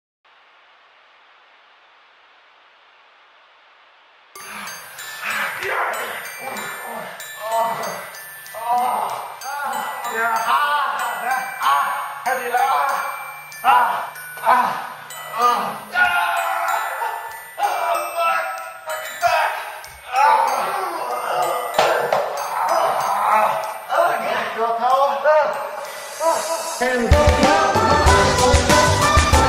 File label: Instrumental